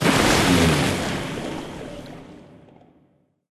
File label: Kraken-Loop-Start; Hero Junpai